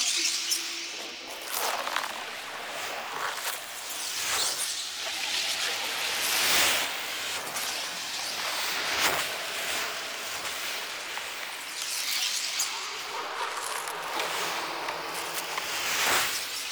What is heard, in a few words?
Fire Mote Pickup Trail Loop; Powers; Motes